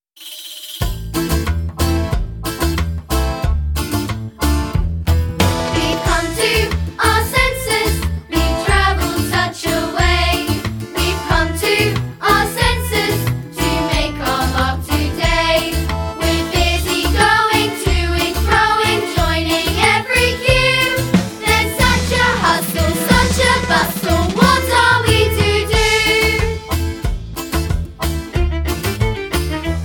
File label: Weve Come To Our Census Vocal; Sunday School Pageant 2025